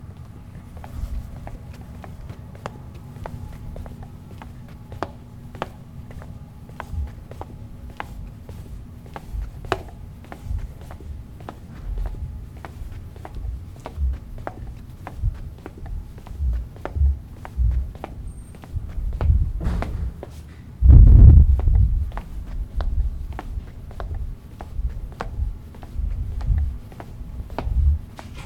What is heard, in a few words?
Walking On Tile With Shoes